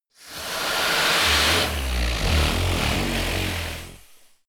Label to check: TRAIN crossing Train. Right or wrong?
Wrong